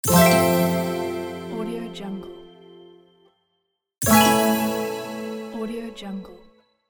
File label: fantasy accomplishment; gamechestaudio